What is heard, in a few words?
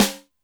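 Snare Edged In, Alesis D4 drum machine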